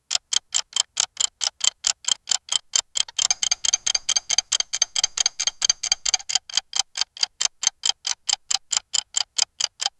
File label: clock timer for a game